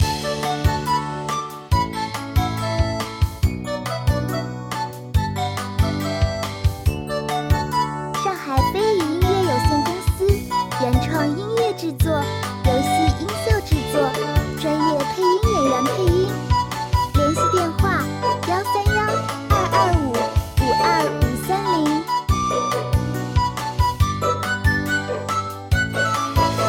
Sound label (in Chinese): Q版欢快; 吆吆游戏